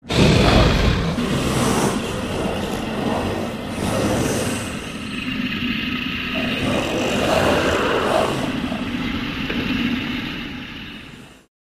Monster Combine, monsters

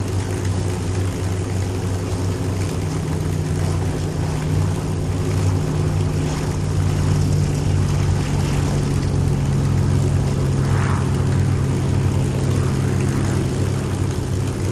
Multi Planes Idle, Fly By, Taxi, Close Up Rev; ww2 fighters

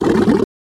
Lion Idl Loop; big cats

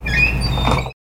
Door Metallic Squea, push bar

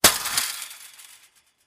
Chain Link Fence Gate; fences

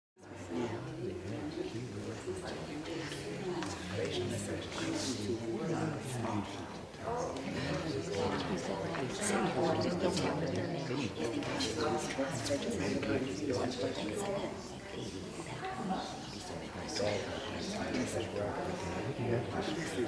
Bus Station Walla Mur

